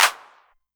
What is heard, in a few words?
808 Clap; Drums